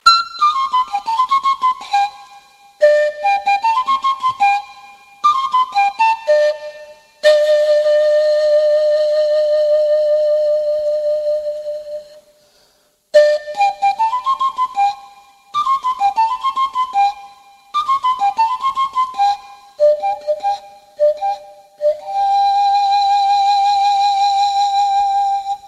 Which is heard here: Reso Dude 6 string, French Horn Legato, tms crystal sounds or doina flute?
doina flute